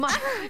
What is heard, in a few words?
air hockey noise; noises